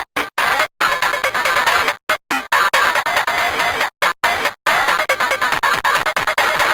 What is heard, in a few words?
horror mode; wenda sprunki